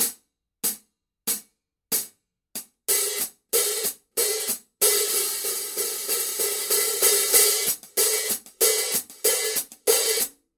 paiste signature dark energy 15 dark energy hats mark i pattern